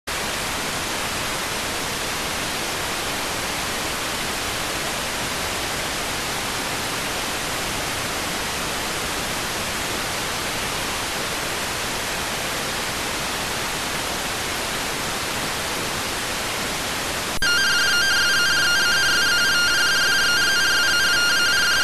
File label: rtty rx